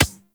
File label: Beach Ball Bounce Dirt; Warframe; Water Fight; Beach Ball Floof